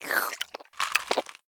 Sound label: Zombie Eat; Zombie Catchers